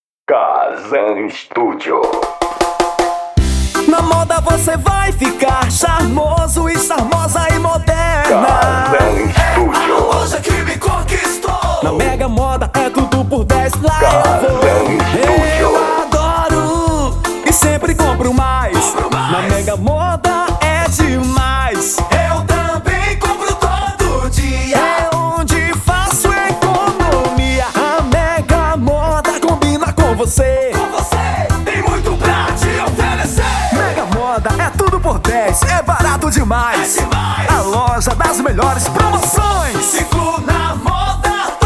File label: jingle; mega moda